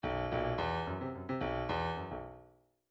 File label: bdf midi file grooves funk r funk r